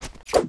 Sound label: swing weapon